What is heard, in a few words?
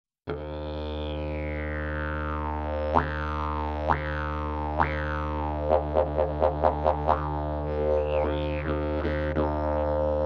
didgeridoo olse koren 700